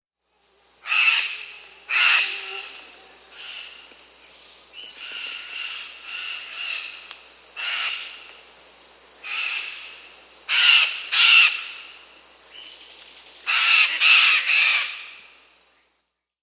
Chestnut-fronted Macaw (Ara severa); S. America

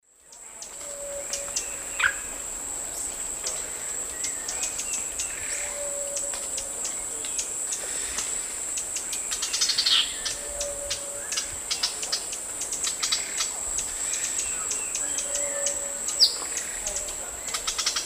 Cantorchilus leucotis, scolds, calls, and song; S. America; Eastern Lowlands

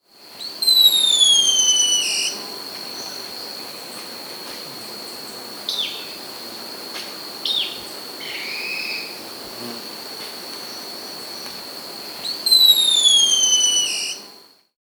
Sharpbill, Oxyruncus Cristatus, S. America

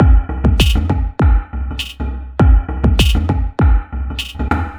Groove, Composite Beats

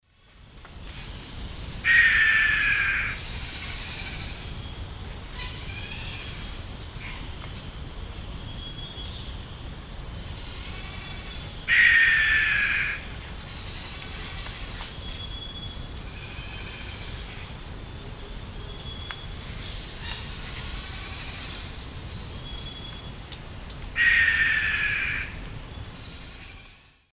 Piculus flavigula, calls; S. America; Eastern Lowlands

